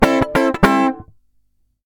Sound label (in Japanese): カッティング